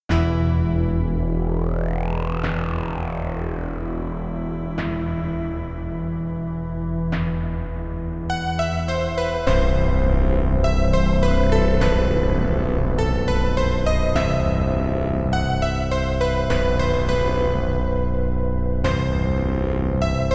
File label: Dramatic Tune [MT-32]; Inferno; MS-DOS